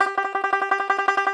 Tenor Banjo, Plucked Strings

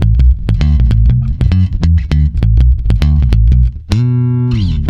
B, swing mute, bass; Spectrasonics; Bass Legends

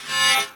hurt; AKAI; FX SIGNALS

